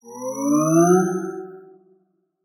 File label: cast spells, magic spell; 魔法/法术的音效